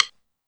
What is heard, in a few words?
RIM, Yamaha DD-6